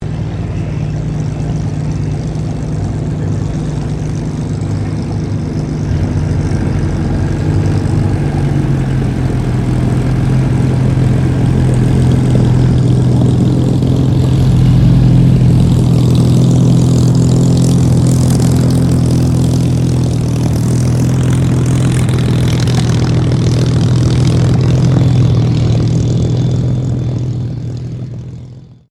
AIRCRAFT SUPERMARINE SPITFIRE MK-VIII TAXI STEREO; Airplanes

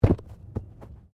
Body movement Tumble Medium; Body movement foley to simulate falls and deaths; Rolling

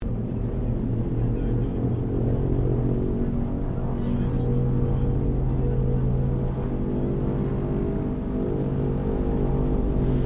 AIRCRAFT DOUGLAS DC3 STARTUP INTERIOR; Qantas 1942 DC3; Airplanes; Vehicles

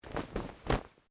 Clothing Shirt Cotton Move; Movemement sounds for various articles of clothing; Dressing; Human

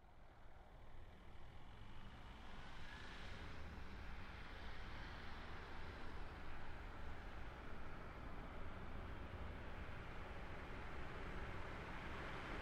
Car Smart Car By Med; 2005 Smartcar Fortwo; Vehicles

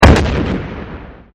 at4, AT-4 Anti-tank Single Fire; Assault Rifles; VRSonic; Guns

